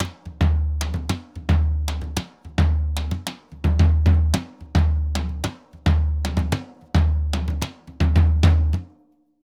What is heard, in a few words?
Surdo Murga 110 bpm; Best Service; Festa Latina VOL-1